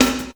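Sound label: snare drum, Drum & Bass; Ueberschall; Drum & Bass Flash Trackz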